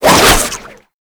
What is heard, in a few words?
Whip Spin Whoosh; melee; weapons; Oraxia